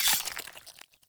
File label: Grunt Death Gore Medium; Sinecure; Melee Grunt; Enemy; Death Effects